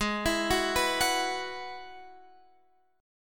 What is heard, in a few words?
Ab Minor 7th Sharp 5th Ab, Guitar Standard; arpeggiated; x,11,14,11,12,14